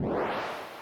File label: dash; Shattered Sword